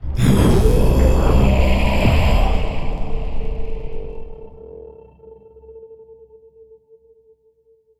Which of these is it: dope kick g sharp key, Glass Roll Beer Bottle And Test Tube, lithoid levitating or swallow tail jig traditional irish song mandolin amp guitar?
lithoid levitating